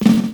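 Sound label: drum roll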